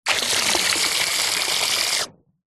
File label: household water spray hose jet into swimming pool short burst close up surface; zapsplat